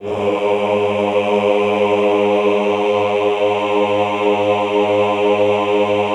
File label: AHH G#1, Classical Choir; Best Service; Extended Classical Choir; AHH LO+HI LL